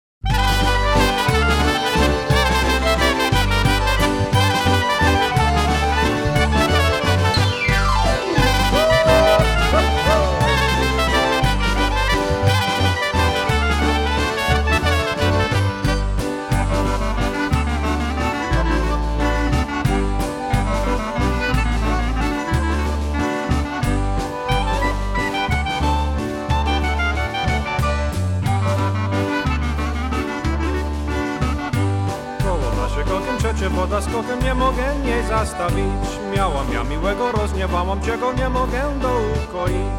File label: Oberek